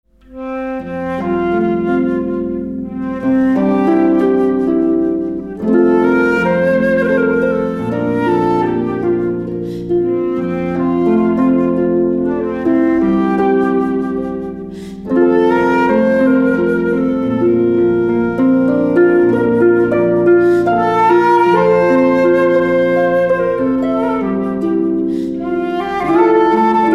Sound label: Harp Flute; Harp and Clarsach